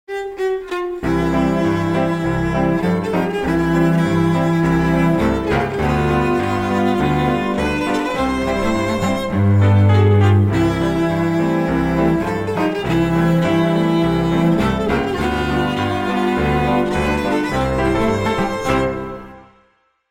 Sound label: Palm Court Trio paino violin and cello